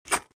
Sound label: footstep